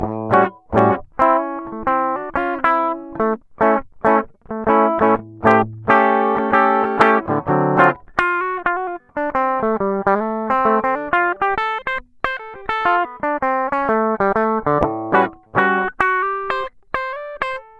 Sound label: guitar sound sample bridge, bass guitar; aurelia